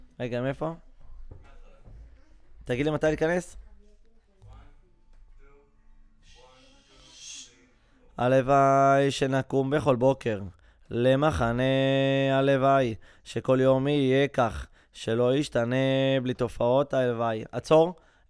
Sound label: VOC GUIDE